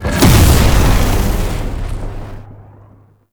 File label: Lancer Frenzy; Warframe; Tau; Prime Grineer